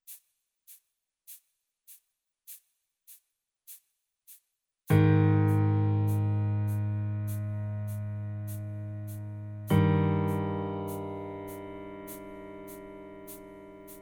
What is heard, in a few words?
Chord; LinkAge